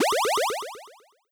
power up item slow

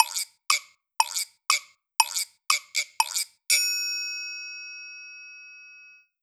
thinking time percussion

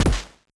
hit lowbit fast

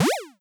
jump low

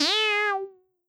cat meow synth

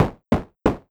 door knock wood synth slow

